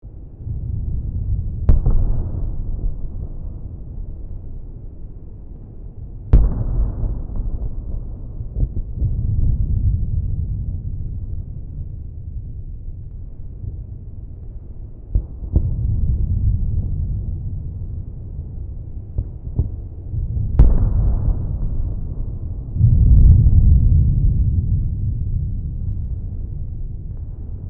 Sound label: Sci fi space battle deep explosions